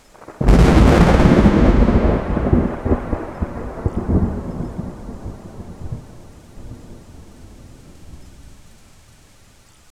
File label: massive thunderclap with, booming